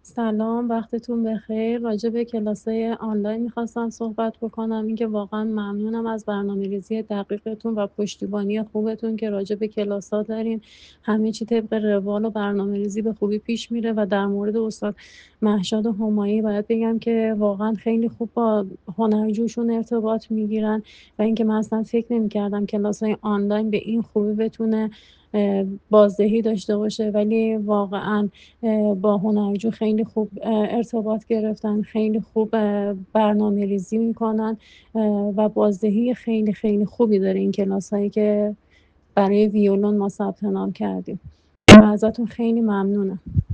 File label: violin